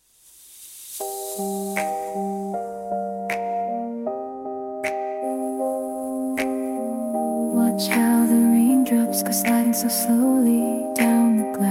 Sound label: lullaby, name songs; cruz